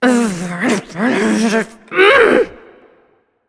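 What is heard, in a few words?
grumble, female; smite; voicepack; hera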